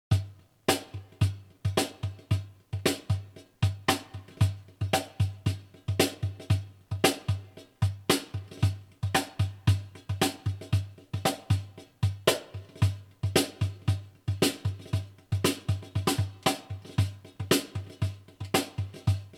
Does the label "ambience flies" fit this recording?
No